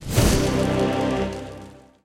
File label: Flame; peace death 2017 pc